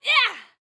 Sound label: Spin; Jackie